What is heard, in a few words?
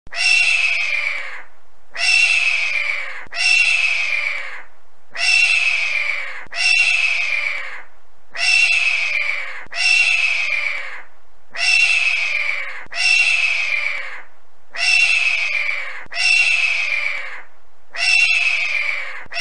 Hawk Sounds